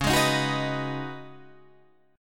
Db 7th Suspended 2nd Suspended 4th Db, down, Guitar Drop D; Db7sus2sus4; strummed